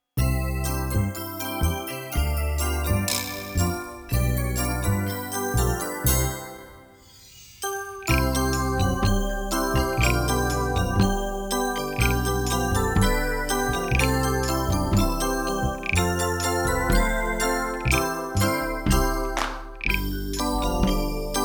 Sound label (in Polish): wersja instrumentalna